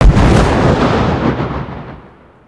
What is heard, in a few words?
ship explosion; destructibles